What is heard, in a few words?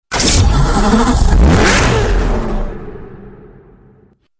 Porsche Spyder